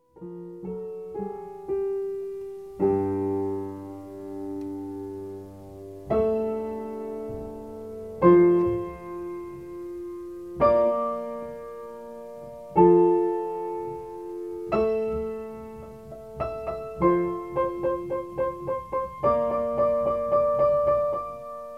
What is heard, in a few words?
Acoustic Piano Ramble